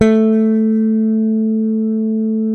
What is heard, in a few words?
SPEC SUSTAIN, Bass